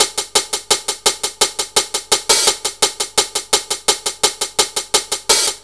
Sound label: 170 hats, Drum Loops, Drum & Bass; 90 s Sample CDs; Total Drum&Bass